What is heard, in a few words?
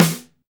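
snare drum; Best Service Gigapack 2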